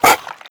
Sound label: breath in, headcrab black; creatures